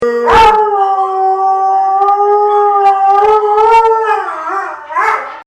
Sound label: coonhound